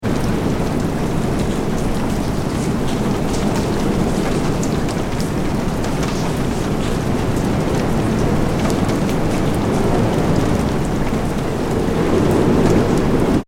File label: weather wind rain light, Wind (Strong) And Light Rain; Wind And Rain - Strong Stormy Wind With Light Rain; McKinney Sound